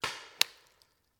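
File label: Paintball Gun Impact; Sound Ideas; Guns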